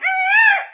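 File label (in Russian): звук крика лугового трупиала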